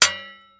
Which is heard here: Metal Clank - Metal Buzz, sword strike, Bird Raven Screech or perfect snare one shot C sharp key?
sword strike